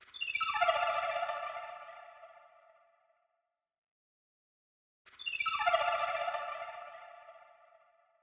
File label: 95 BPM D Min Arp; Melody Loops; Loop Stems; Cymatics; Space